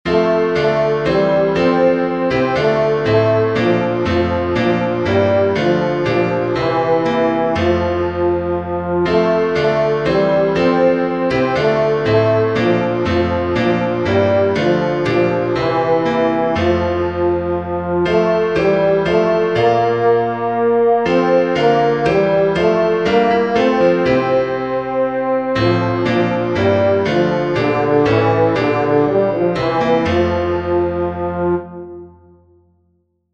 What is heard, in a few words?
praise to the lord sound tenor; Praise to the Lord the Almighty